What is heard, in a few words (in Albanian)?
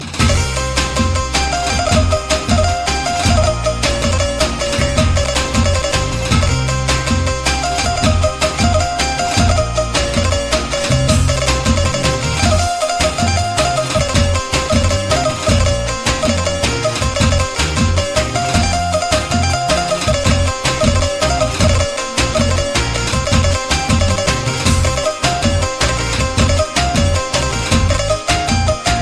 Melody Shqip